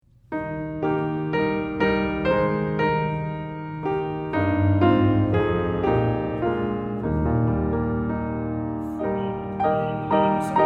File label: Piano; The Missionary Hymn; piano accompaniment pieces